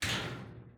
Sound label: Drawer Hit x2; Soundeffects